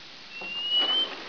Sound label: rat